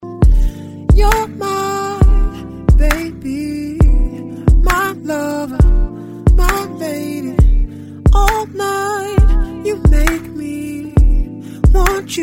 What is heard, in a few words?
BEAUTIFUL RINGTONE